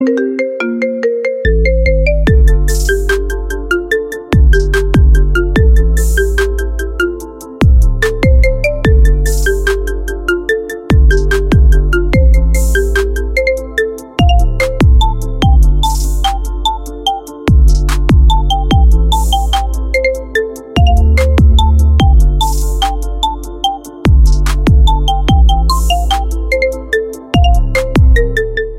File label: iPhone Marimba Remix